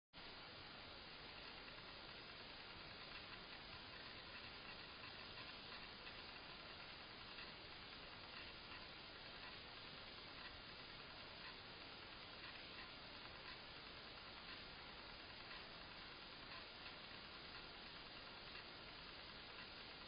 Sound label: lion